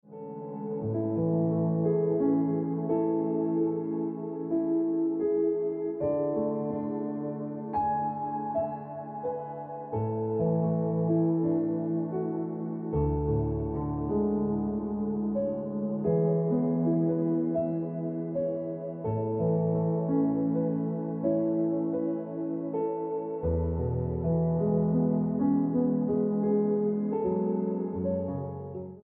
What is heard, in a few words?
Piano Music; Ringtone